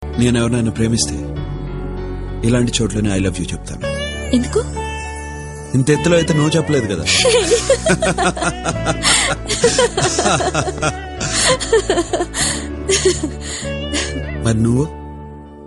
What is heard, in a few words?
Veena Music